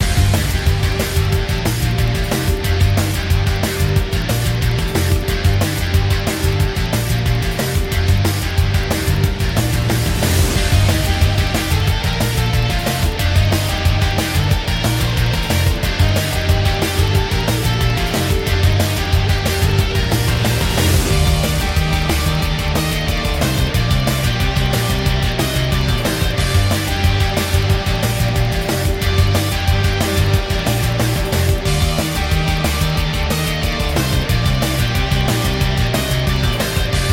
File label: battle lastboss; beasts